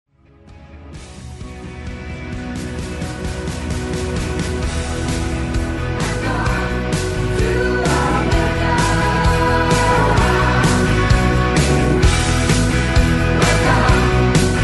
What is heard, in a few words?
jazz